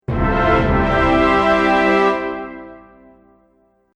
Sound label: Epic Orchestral Ending; Musical